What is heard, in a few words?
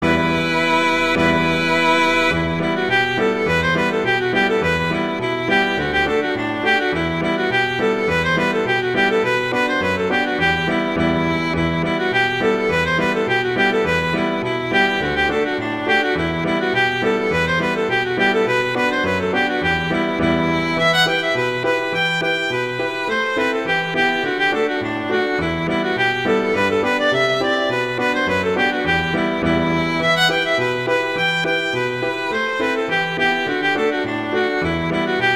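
Reel, Viola Piano; Fiddle Collection; Scollays Reel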